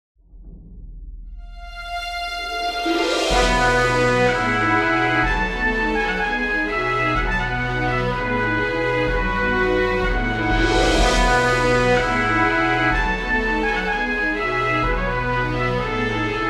sky intro music scary

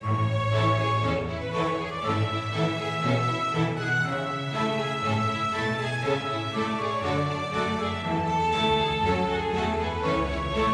happy forte; Quivr; PS4 Windows gamerip 2016

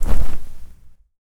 fall foley; Aragami

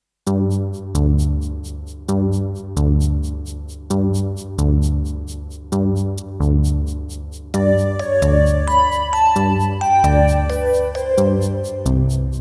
Underwater; Super Mario Galaxy 2

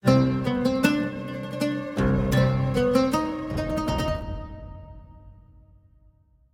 Action Gear Up 105bpm Intro

